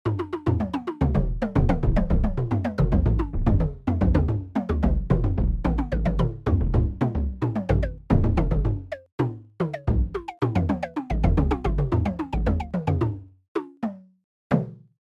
indian percussion naqqara

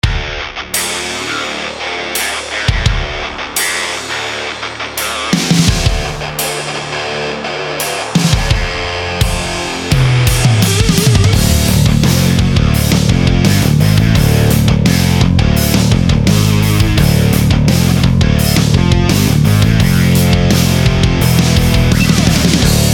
bass bulldozer; shreddage bass

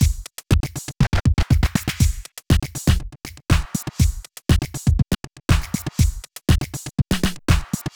beat

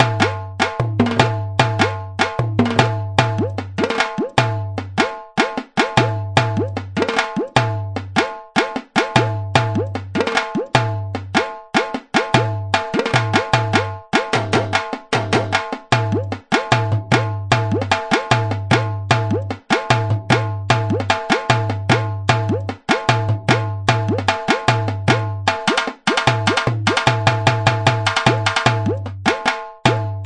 ghoomar

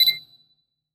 beeplink

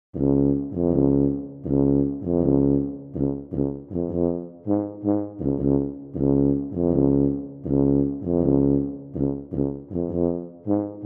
brass tuba

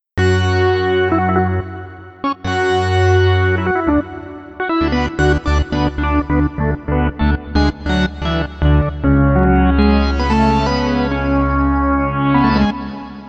chorusfx lead

